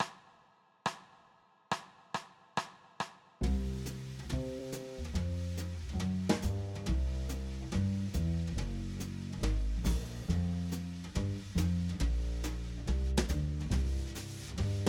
C major blues no piano soprano; Applied Jazz Ear Training; Practice Perfect; 12 Bar Blues Ear Training in Real Time

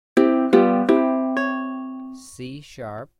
C#4 Ukulele Major Note Name; ear training instrumental color

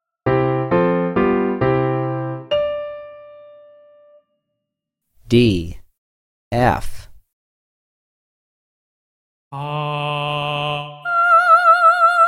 D4 F6 Grandeur Piano 2 Note Singing; ear training two note; Level Three “Singing” Example

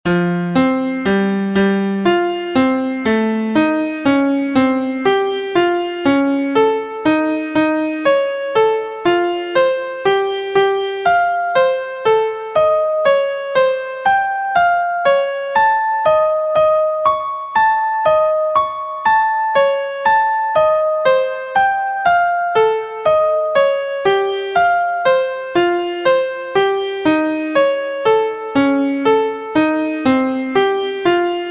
Degree 1 2 3 5 b6 b7 Atomic Scale Key F; harmonic and melodic equivalence; trichord pair

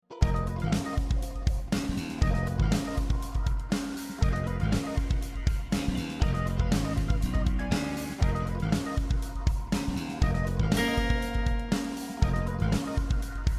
F Dorian b5 Mode; Harmonic Major; Direct Application

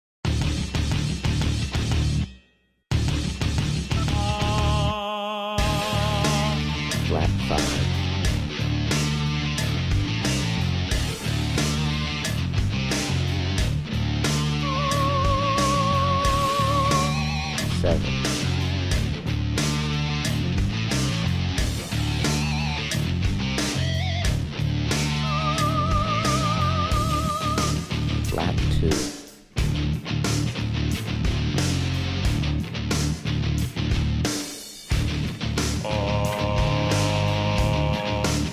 Key of D Med; heavy metal; real heavy metal ear training

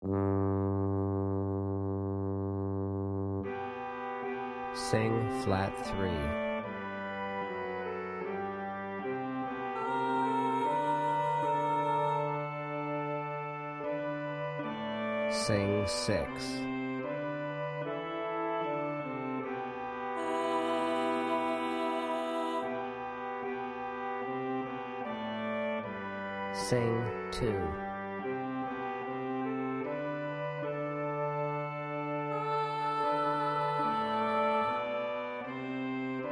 Chorale Organ Alto Sop Tenor; Bach Chorale 1; classical ear training

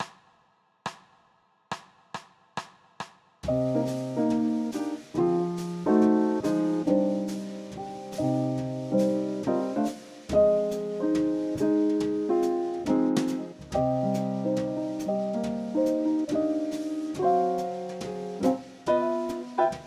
C minor blues no piano tenor; applied jazz ear training; Practice Perfect

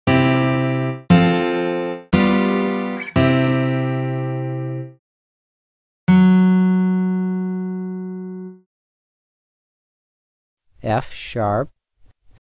F#2 Acc Steel Guitar Major; ear training instrumental color

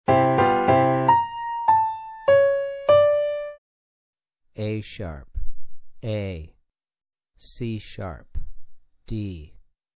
Piano Maj a#4 a4 c#4 d4; ear training four note melodic

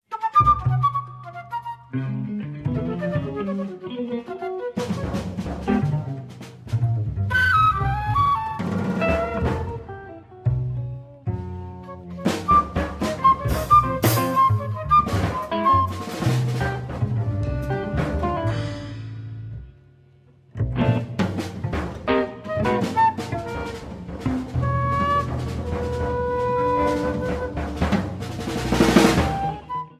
string Qt 1st Mvmt Improv; 5 mvmt for string Qt; music of webern

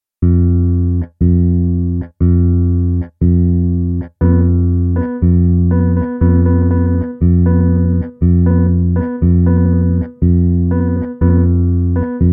F Drone 1 3 Quarter Eighth Rhythms 120 BPM; reading notes rhythms plus ear training

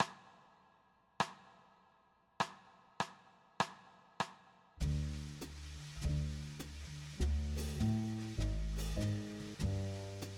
D Major Blues No Piano Alto; Applied Jazz Ear Training; Practice Perfect; 12 Bar Blues Ear Training in Real Time

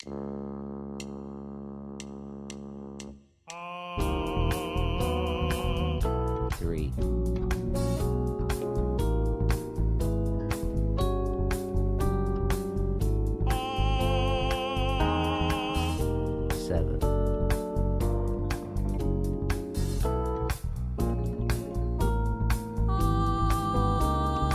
Db Blues Slash Chords Funk; slash chord ear training

